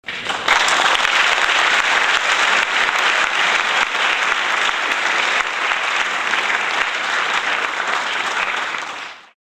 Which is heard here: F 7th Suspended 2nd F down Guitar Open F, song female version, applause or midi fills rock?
applause